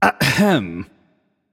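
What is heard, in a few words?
nonverbal clear throat; voicepack; smite; merlin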